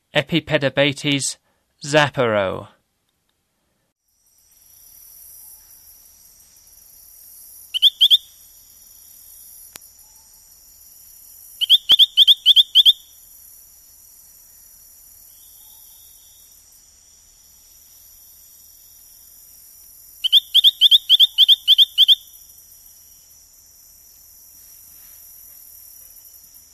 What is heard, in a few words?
Epipedobates zaparo, Frogs of Ecuadorian Amazon; S. America